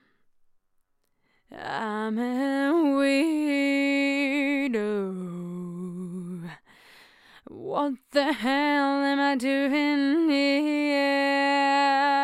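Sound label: Chorus, Vocoder Vox; WA87; Dundee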